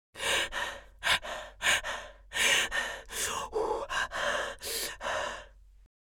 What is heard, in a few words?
Mature woman rapid breathing